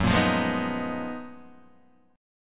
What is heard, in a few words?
F sharp Major 13th Guitar DADGAD